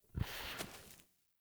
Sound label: Wolf Steps Jog Stop; Animals; Foley Actions